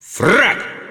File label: killsound; tf2